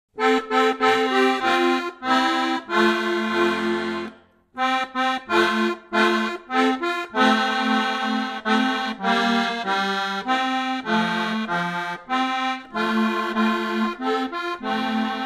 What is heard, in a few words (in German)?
die gedanken sind frei inst; Volkslieder